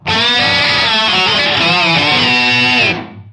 sick lick, Guitar Noizes

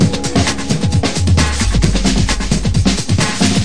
132 bpm, lamb groove, drum loops and breaks

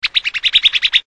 annoying tropical bird; Zoo and Nature

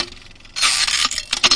parking meter; Sound FX Real Life

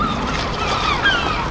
157 beach-seagull-loop, melodic loops

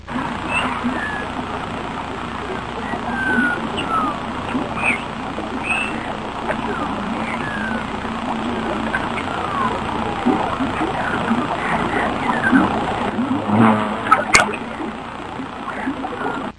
swamp

